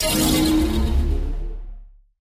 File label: portal start; Minecraft; Votive Content